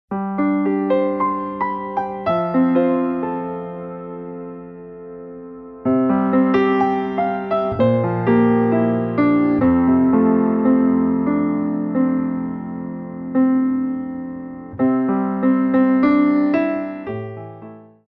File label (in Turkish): santral fon muzikleri